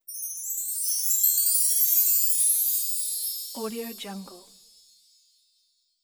chimes

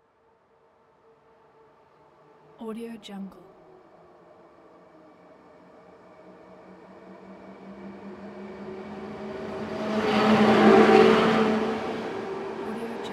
passenger train pass by

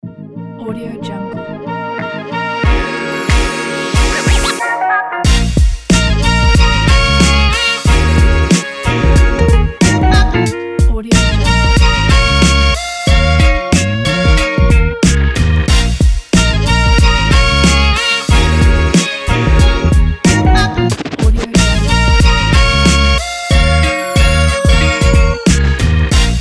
hiphop

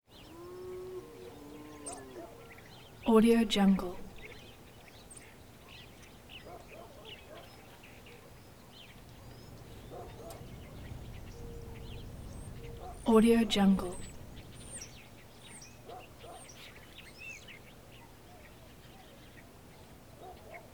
easter morning exterior constant early morning; prosoundfx

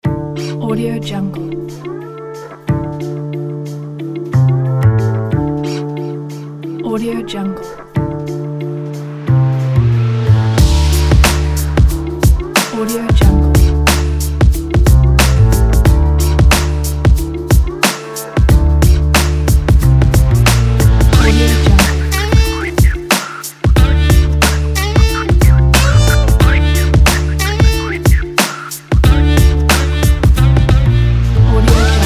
vintage hiphop joint

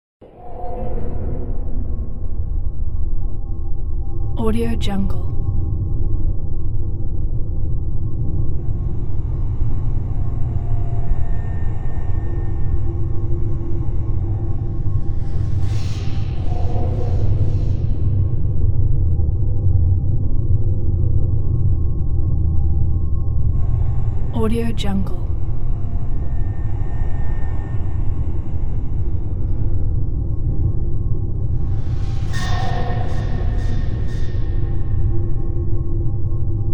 dark atmosphere sound

